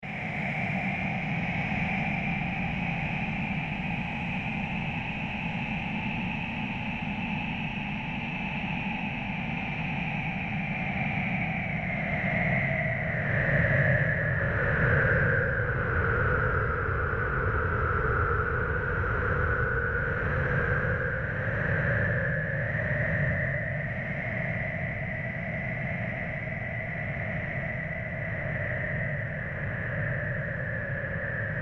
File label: Created Surge Fade Stereo, Various sweeps, pulses and drones; Rises and Dives